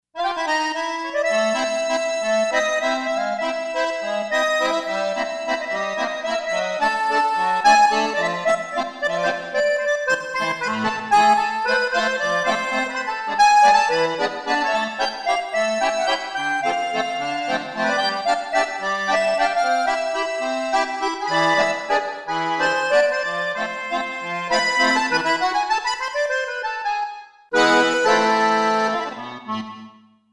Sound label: Accordion